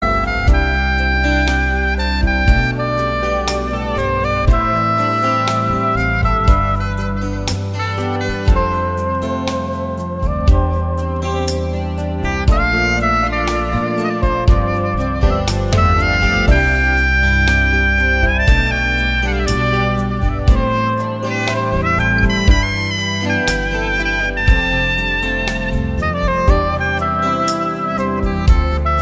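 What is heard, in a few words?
awesome saxophone; blues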